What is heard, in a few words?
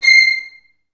C6, Advanced Orchestra; Best Service